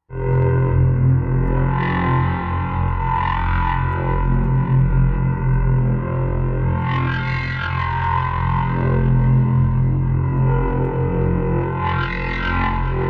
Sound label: Spent Rods Machine Amb